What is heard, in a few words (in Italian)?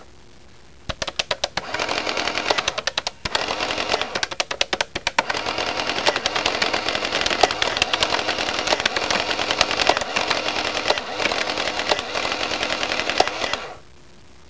suonerie businessy